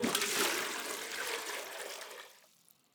waterbucket fill container; Rust

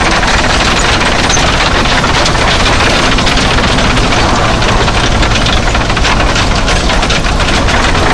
tracks heavy speed, tanks; War Thunder

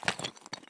walk tile; Escape from Tarkov

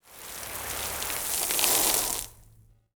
wave 2d

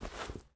ui pickup leather; Rust

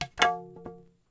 Drop Staff; Civ4 warlords

